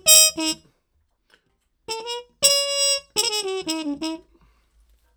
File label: 115 Trad Jazz Trumpet _Ebmaj; Brass; Pro Tools Essential Loops; Digidesign